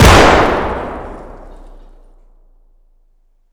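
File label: grenade explosion indoor distant; Escape from Tarkov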